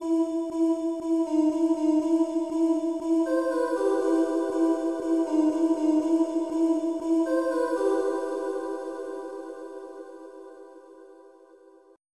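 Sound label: space voice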